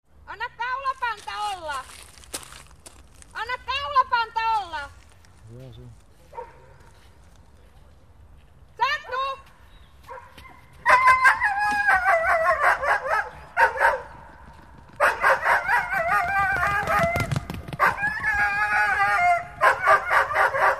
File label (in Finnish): vinttikoira